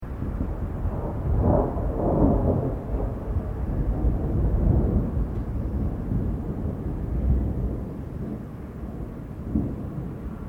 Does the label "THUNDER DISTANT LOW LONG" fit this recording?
Yes